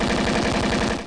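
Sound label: machine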